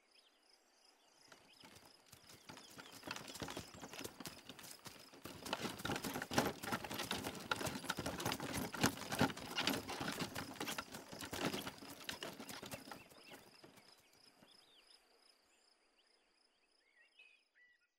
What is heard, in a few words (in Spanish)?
carro de caballos